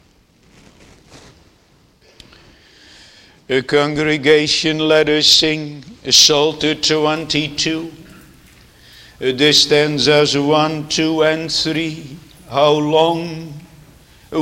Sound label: Singing